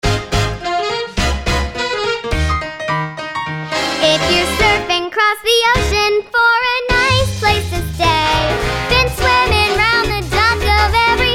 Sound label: kids singing